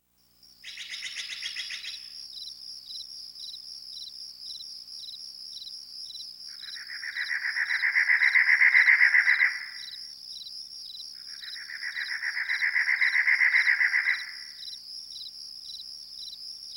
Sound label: Night Critters and Crickets Ambience